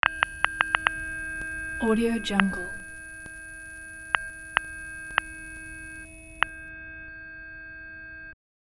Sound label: radiation dosimeters